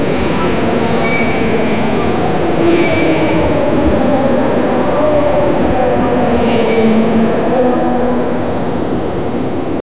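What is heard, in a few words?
ambient airport traffic noise, announcements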